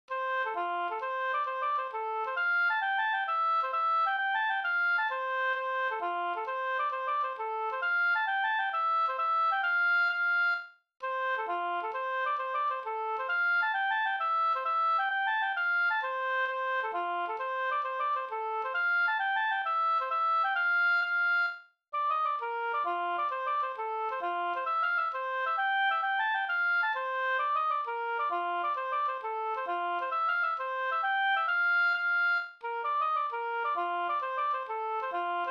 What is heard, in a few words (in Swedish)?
hambopolska